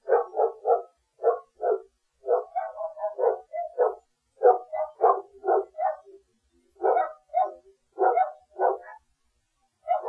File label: barking, neighbors dogs